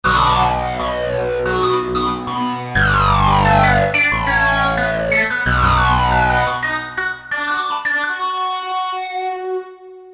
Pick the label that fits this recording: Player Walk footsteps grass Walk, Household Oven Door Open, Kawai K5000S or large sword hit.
Kawai K5000S